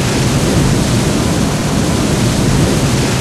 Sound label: Water hose; In the Line of Duty Firefighter